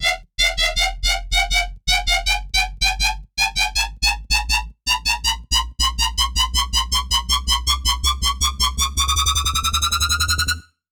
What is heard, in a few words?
Club Music Risers Low Strings